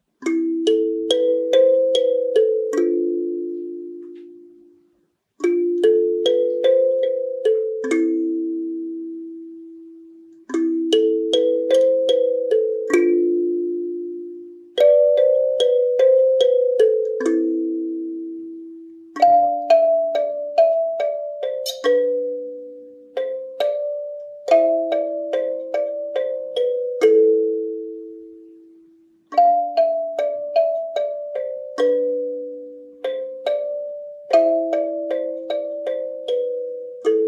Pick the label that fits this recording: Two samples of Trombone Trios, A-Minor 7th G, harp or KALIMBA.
KALIMBA